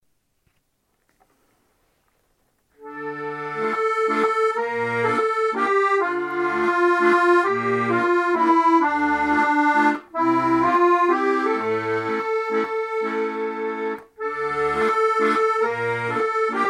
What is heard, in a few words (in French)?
Musette; Frankreich